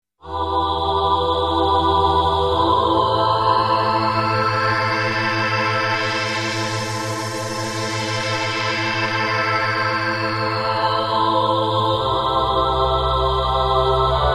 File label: VOX MOVE